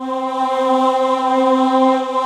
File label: Choir; B CHOIR